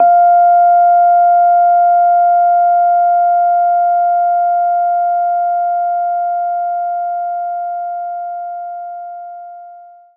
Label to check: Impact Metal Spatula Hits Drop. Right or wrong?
Wrong